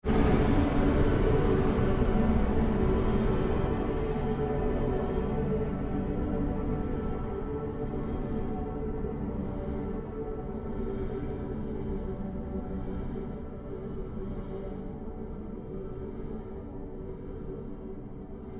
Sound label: Created Pulse Fade Long, Rise And Dive Sound Effects; sound building blocks created through manipulation of raw material